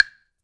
blocks, percussion; InVision Interactive; Percussion Slam; MACHINE

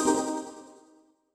C3, Synth Leads

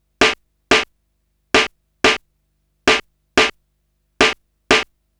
Snare Loop

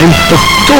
FX RAP, Big Beat Samples; Big Beat Samples & More; AKAI; SHORTFX KIT

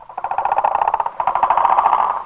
AFRICAN FROG; ANIMAL FX; Greytsounds VOL-1

